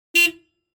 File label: Car single quick honk